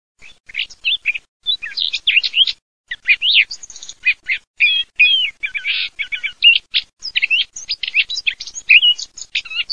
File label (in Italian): STERPAZZOLA